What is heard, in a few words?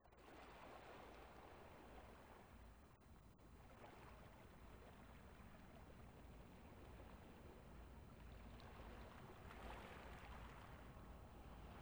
Sounds of nature, Ocean Shores; Relaxation & Meditation with Music & Nature